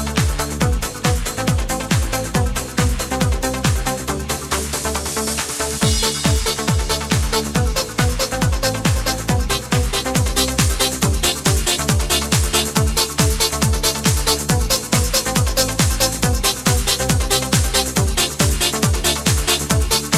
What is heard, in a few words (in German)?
hoehen-anhebung